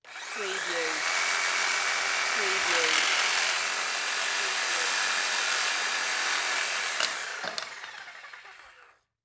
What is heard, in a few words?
Jigsaw Cut Pvc Pipe; Sound Effects Bible